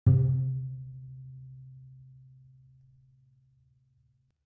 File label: ambient